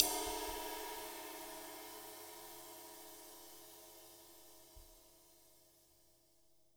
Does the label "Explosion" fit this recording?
No